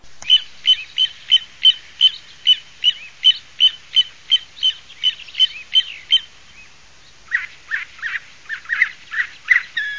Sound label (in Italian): TOTANO